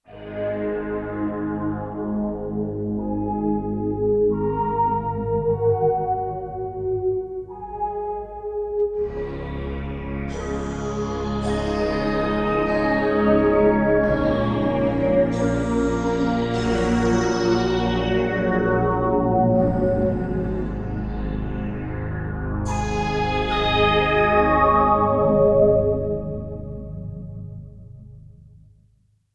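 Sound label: Slow Japanese Flute; Digital Synthesizer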